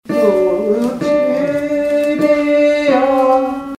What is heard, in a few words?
tenor; Ecce Quam Sit